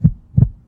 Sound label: heartbeat